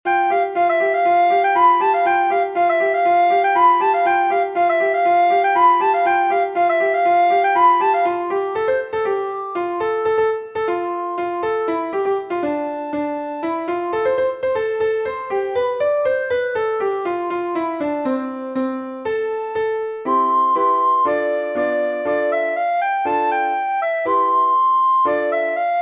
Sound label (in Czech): duet